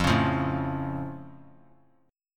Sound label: F Augmented F; strummed